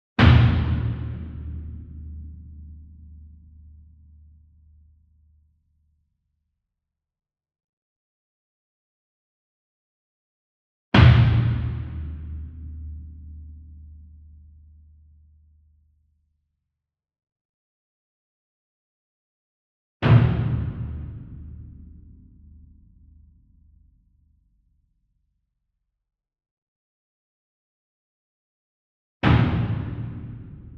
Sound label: Bass Drum Concert 40 Inch Hit Soft Beater Far; SINGLE DRUM; boom library; Cinematic Strikes Construction Kit